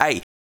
Vocal Chant Aye; Ableton Live 12 Lite; Core Library

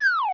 jump off edge; Open Zelda; Quest Designer 2.0.4b

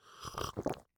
drinking tea

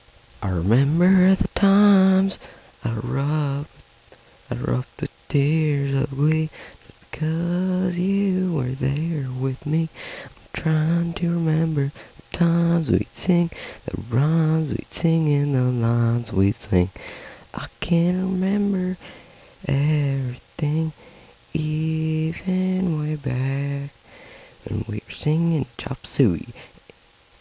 Country song sample

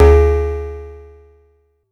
Bell Ring; One Shots; Drums; Ableton Live 12 Lite; Core Library

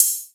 Hihat Open High Machine

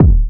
Kick; Ableton Live 12 Lite; Core Library; Drums

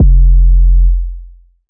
Synth Note Wide Phatty F

